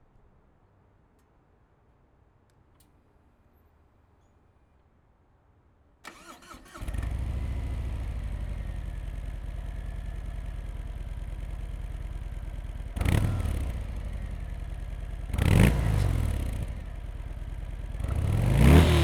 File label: Rev, Fat Bob 107